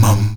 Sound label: MUM G#2, Heart of Africa; Spectrasonics; STAC. MUMS